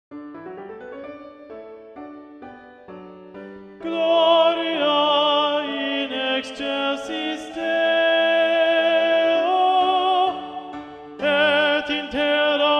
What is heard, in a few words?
Tenor; Mass No2 in G Mvt 2 Gloria; Practice Room